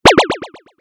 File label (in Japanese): キャンセル